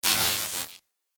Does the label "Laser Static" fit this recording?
Yes